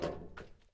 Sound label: walk metal; Escape from Tarkov